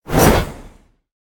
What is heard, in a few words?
basic swing; smite; thanatos